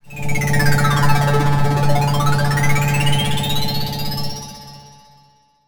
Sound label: sound design manic key drone; zapsplat